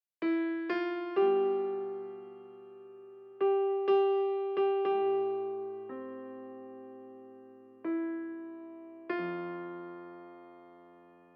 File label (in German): Klavier